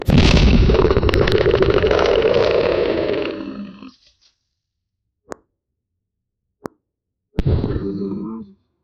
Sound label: a rubberhose cartoon styl; obese